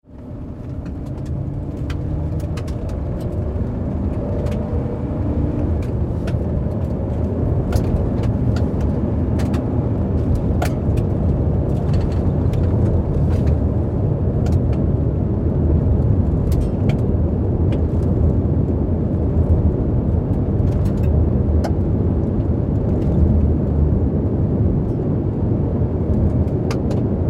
auto drive interior gravel road light rattle suv ford expedition